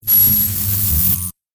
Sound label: Electric Discharge, Magnetic, Science Fiction; Sci Fi Sound Effects; Blast Wave